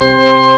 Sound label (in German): Orgel; ST-XX Amiga Soundtracker Sample Packs